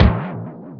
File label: boom